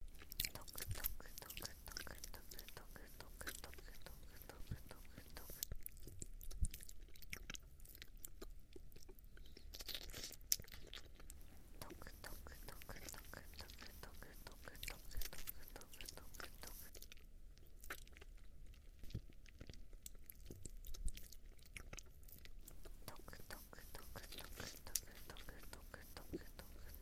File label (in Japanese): とくとくとジェルボール R